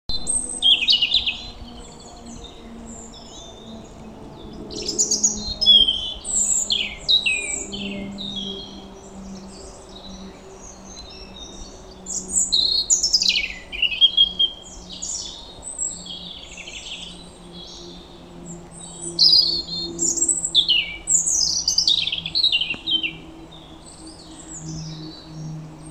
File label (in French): Rouge gorge